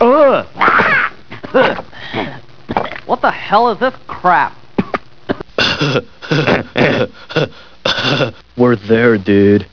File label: laugh